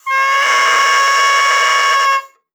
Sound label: OBOE3; Xsample VOL-6